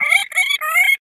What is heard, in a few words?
Parrot Squawk